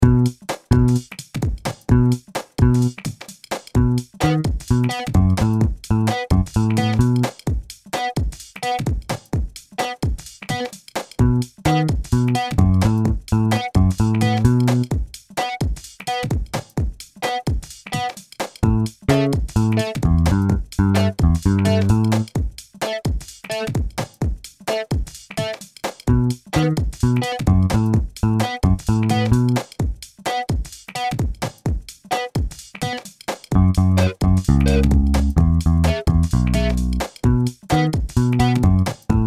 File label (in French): basson; methode basson